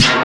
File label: Perc, FM Perc, Rappin Perc